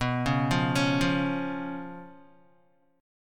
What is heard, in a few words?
B Suspended 2nd Flat 5th B down Guitar; arpeggiated; JGuitar